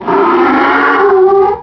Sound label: growl whine; ghouls forest2; growler